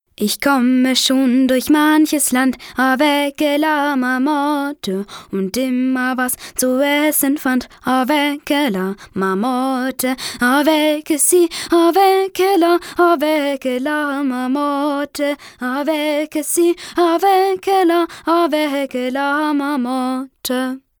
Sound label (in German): Song, maedchen; La Marmotte